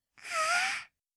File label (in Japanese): happy wa, breath